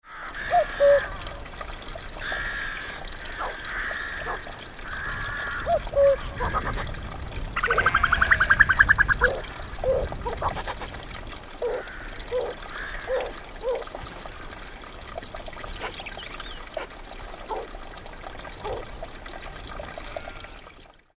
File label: cuckoo